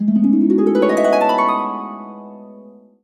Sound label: Harp Open; Wario Land Shake It